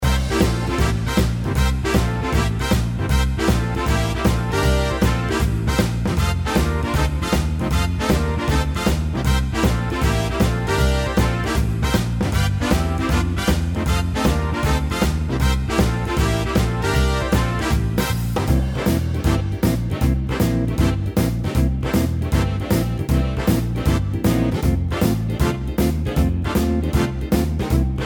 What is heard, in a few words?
Shufflin